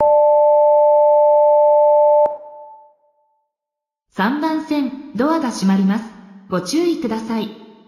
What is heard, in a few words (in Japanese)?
3番線ブザー